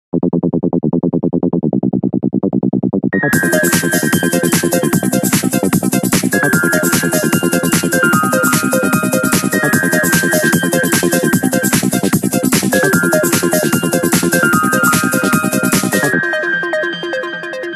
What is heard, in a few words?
Nokia 9300i phonetones; Everlasting